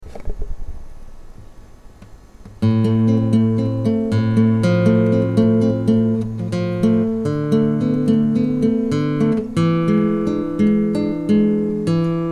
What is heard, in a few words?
a minor prelude, classical guitar